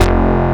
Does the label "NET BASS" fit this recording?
Yes